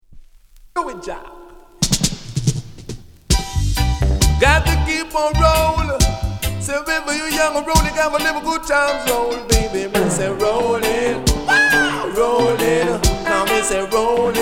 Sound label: REGGAE ROCKERS